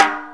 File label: Snare B; Sonic Foundry (Sony Creative Software); Loops & Samples Drum Tools